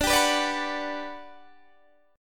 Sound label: G Major 7th, D